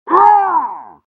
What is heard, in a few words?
pain severe; tfc; svencoop